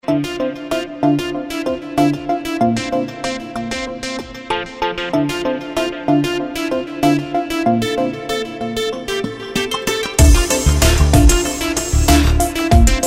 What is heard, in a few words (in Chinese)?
专题片背景音乐